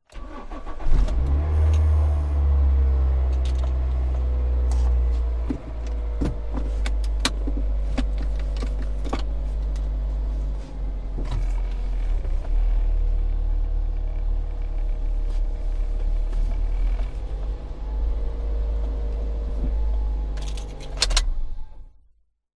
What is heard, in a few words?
vehicles car manual start drive very short distance park